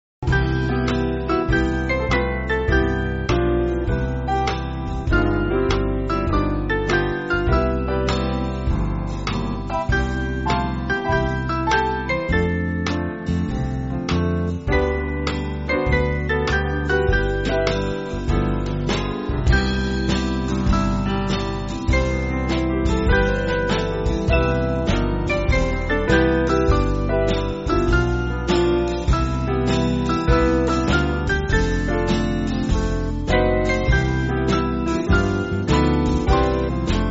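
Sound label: Band, Music Backing Files for Churches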